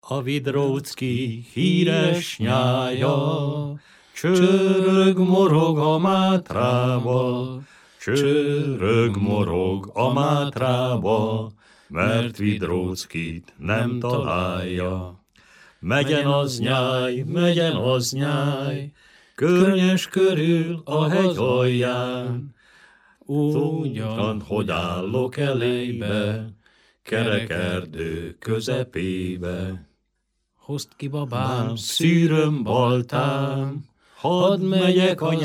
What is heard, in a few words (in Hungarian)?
nepdal; a vidrócki